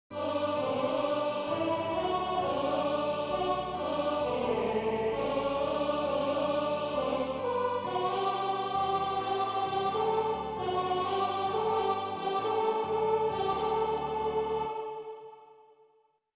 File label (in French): Alleluia des montees a 4 voix avec schema melodique invocation a 2 vo; Messe de Verrieres